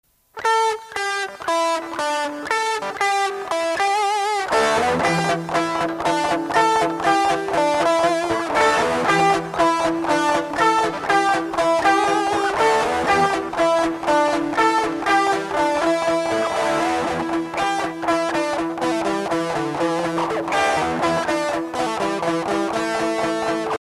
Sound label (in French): Rock